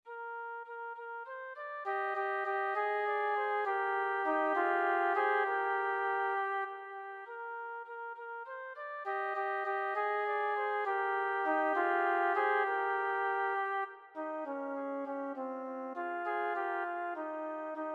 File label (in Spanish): Campanas VOZ